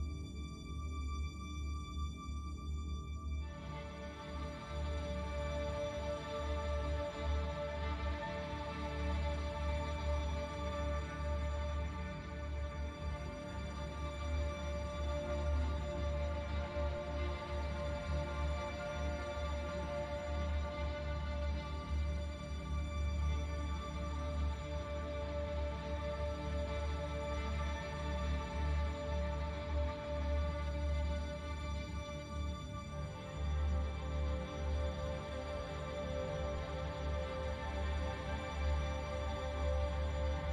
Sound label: Deep Melody tension; Hollow Knight: Silksong